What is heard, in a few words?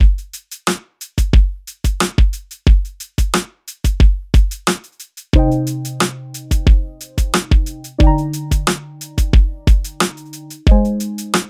blues